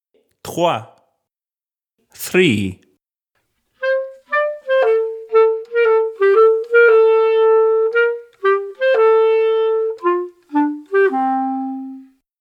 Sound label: Clarinet